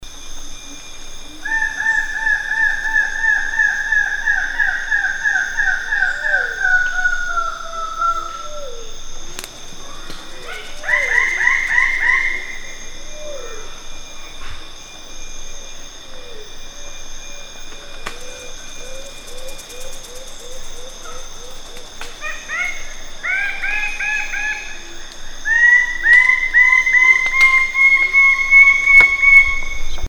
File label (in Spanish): sonidos ave del paraiso esmeralda menor